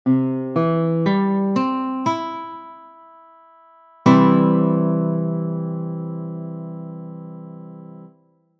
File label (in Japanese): c と cm の違い メジャー